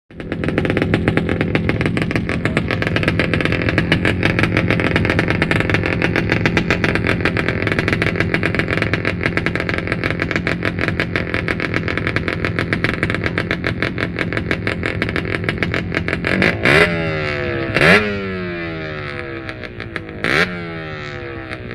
Motorcycle; Idle / Off; Trials Bike Idles With Revs, Eventually Switches Off.